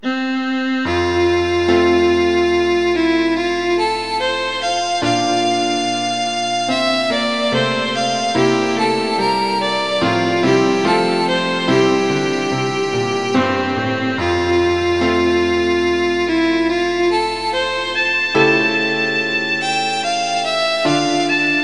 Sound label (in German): traeumerei violine u klavier; kinderszenen 07